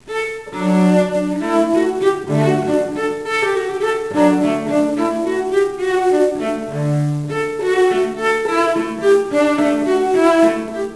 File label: Gigue, cello; Bach Suite 6